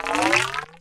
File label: spine plunk; Zaps and Beeps